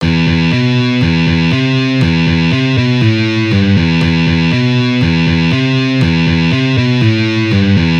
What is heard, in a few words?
Easy Guitar Riffs